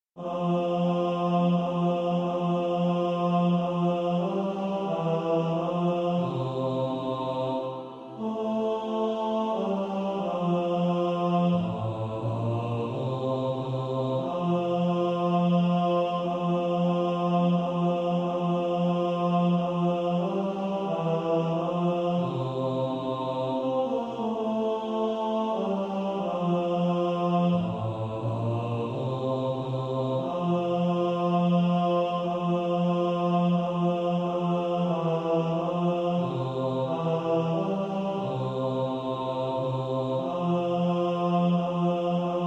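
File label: bass; Our great savior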